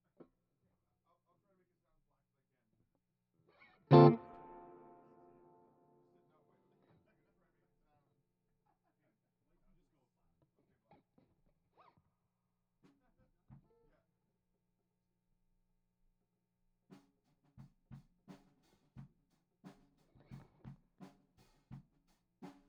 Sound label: GTR R84